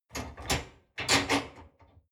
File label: dishwasher frame fold up